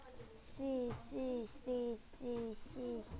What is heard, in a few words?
sea